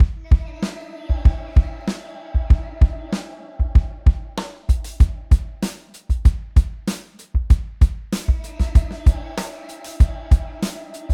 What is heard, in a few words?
DRUM